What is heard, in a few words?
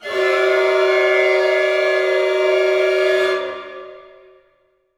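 orchestra; Masterbits - Switch FX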